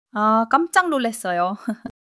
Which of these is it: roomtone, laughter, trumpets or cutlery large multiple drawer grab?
laughter